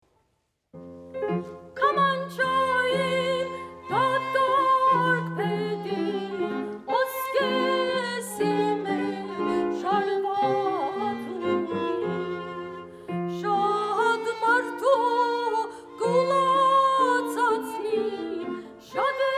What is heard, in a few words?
Kyamancha Spiked Fiddle; Ann Arbor District Library, Ann Arbor, Michigan; Suni Concert